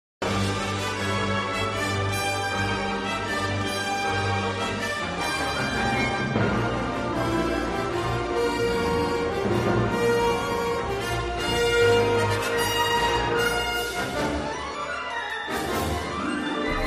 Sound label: level complete; lego star wars